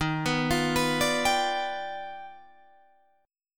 D sharp Augmented Major 9th D sharp Guitar Drop D

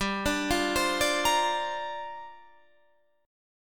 G Minor 11th Guitar Standard, Gm11 chord; arpeggiated; JGuitar

